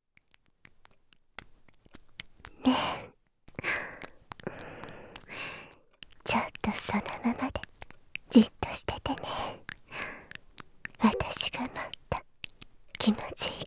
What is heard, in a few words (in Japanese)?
耳舐め L; 宿屋のお姉さん